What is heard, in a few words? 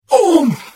Hit; The Elder Scrolls III - Morrowind